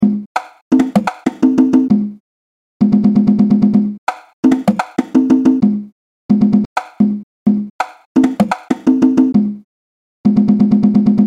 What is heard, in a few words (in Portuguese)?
base de funk